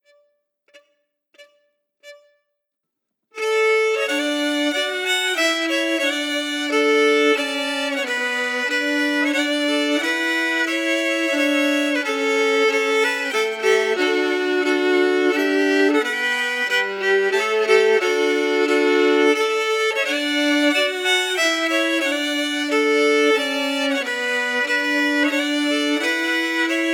Lochanside harmonies emphasized

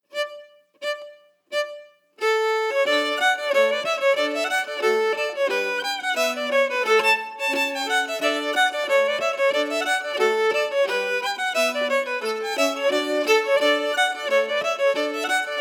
Reel melody; Reel de St. Joseph

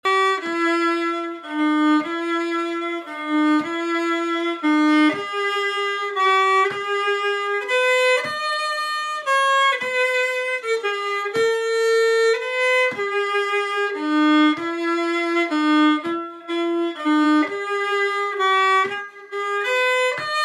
Waltz; Vanjan Waltz

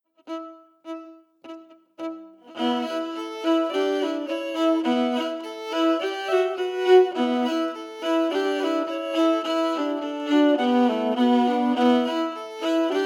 Polka harmonies emphasized

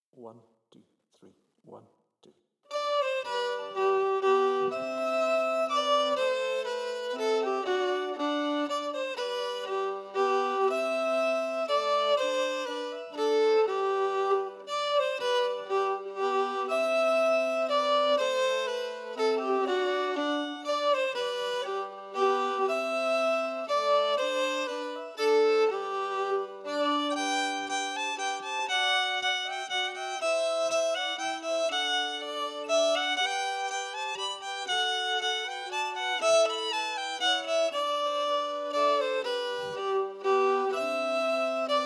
Merch Megan melody emphasized

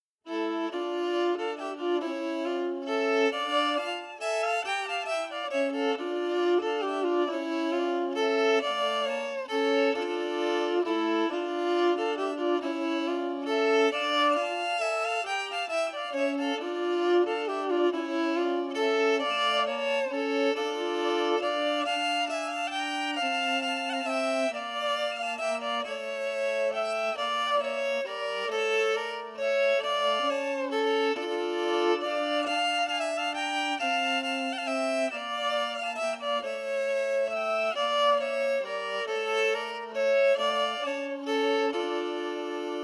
Vals harmony emphasized; Vals efter C J Bjorklund

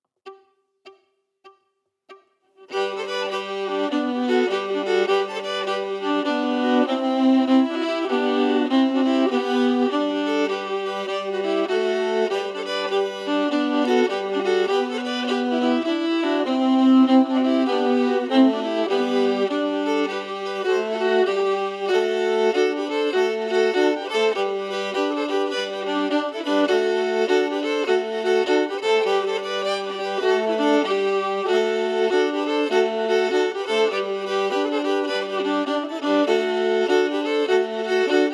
La Marche au Campe harmonies emphasized

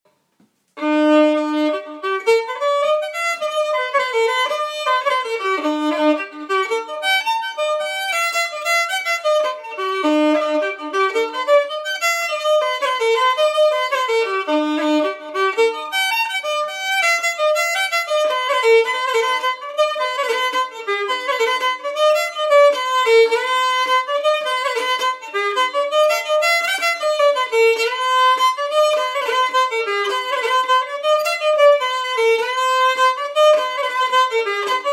Burnt River Jig

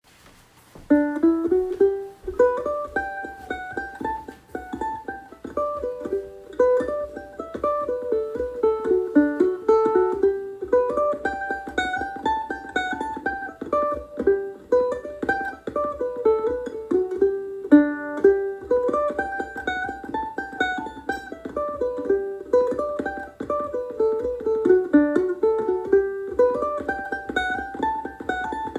Reel de Louis Gagnon mandolin

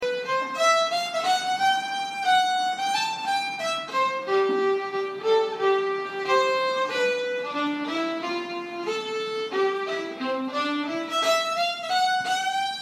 Quickstep

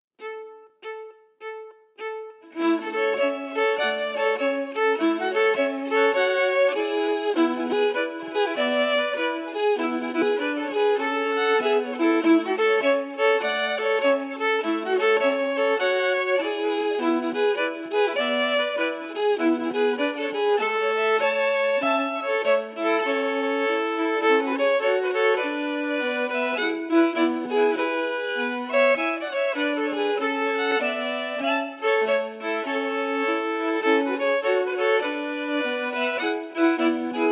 Danse Chez Nous harmony

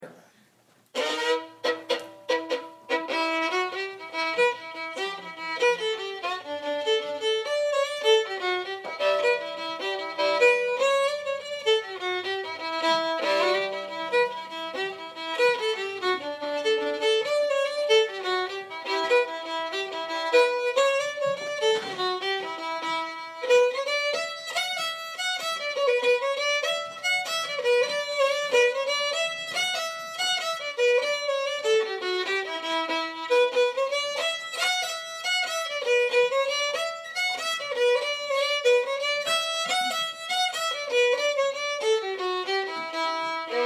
Jig

